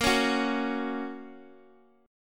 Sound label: A sharp Minor Sharp 5th, Guitar; strummed; 4A,4E,5A,5D,5G#,6C#; JGuitar